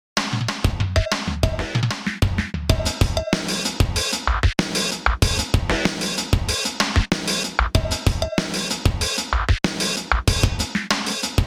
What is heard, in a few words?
cow 95 bpm